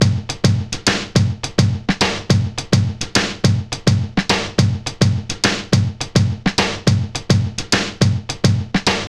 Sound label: Big Tom, Breaks, 105 BPM; loops; Loopmasters; Jon Carter & Alex Blanco; Block Party Essentials